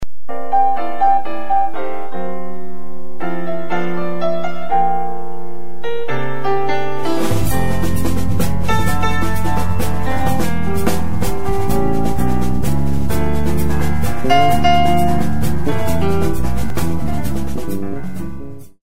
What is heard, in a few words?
mazurka